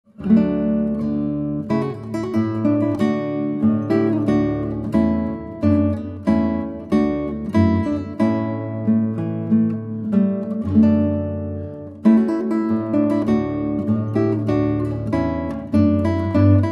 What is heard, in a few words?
trumpet